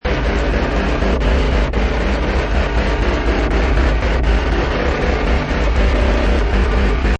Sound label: Loop Atmosphere Evil 135, Evil Atmosphere Loop 135 bpm.; Synth Loops